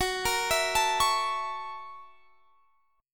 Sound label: Gb 7th Sharp 9th Gb Guitar Standard; arpeggiated; Gb7#9; x,21,20,21,22,21; JGuitar